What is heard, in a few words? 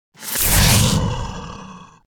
Growl Beast Attack - Monster Growl; Hollywood Edge; sound designer's toolkit v2